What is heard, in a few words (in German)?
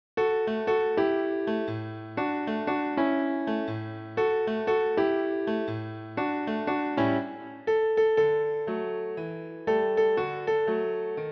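Klavier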